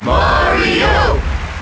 Cheer; Mario; Melee

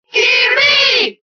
Cheer Italian; Kirby; SSBB

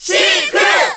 Cheer Korean; Sheik; SSBB

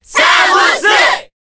Cheer Korean; Samus; SSBB

